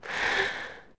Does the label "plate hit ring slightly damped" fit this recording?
No